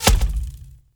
arrow wood

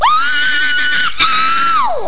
cry

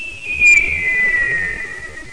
whistle

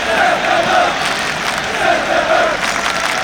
chant; FIFA 2001

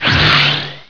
SPELL; Spells; Human Sounds

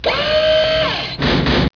SERVO

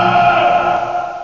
yell; FinalOdyssey

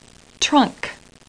trunk

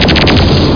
freeze; Total Chaos Nukem 3d Pack

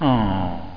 lose game; Hang2000

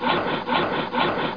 Build; Ocean Bound v1.0

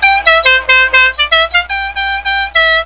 HORN; mtmtrial

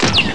Ignite Sound

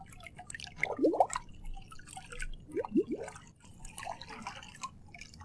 waterlap; ambient; Shadowman

